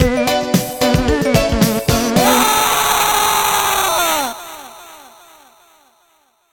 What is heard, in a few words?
Title Card - Hallway Longway; Loco Tower